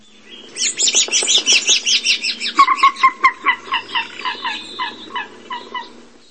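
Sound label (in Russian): свист стук и голосовое пение птиц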